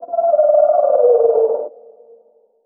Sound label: chirp, protozoan; hl1 npc